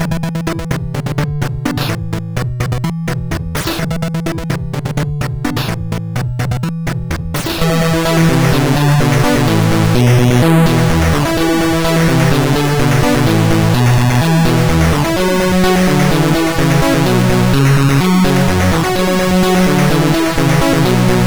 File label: sid MOS6581R4; Contact Demo